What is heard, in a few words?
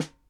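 snare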